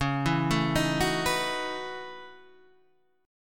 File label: Gb Minor 13th Db